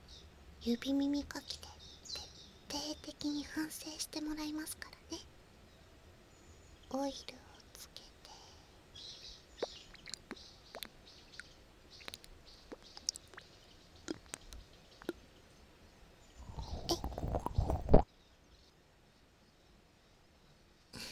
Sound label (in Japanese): 【左】オイル指耳かき, ASMR; エルフメスガキ; 森の癒し耳ほじり